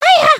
Wave Fast; emotes

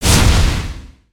super cannon fire; destructibles

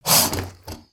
Pet Croc Happy; creatures pets; Lego

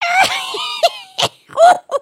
laugh

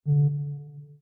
Delete Rollover

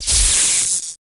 terminal shock; build mode

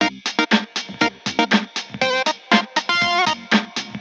Boombox Beat

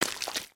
Foot Mud Walk Light, footsteps

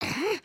generic build; emotes

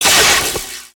Shock console; foley

